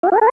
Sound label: Sword Charge; Charge up the Sword; Zelda